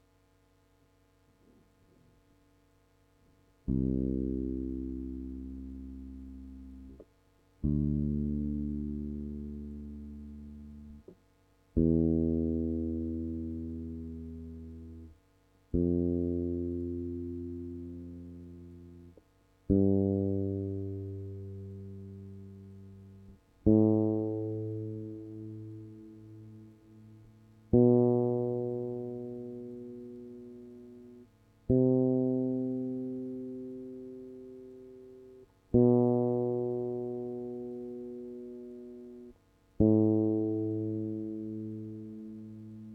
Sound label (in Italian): base per improvvisazione modale scala do 60 bpm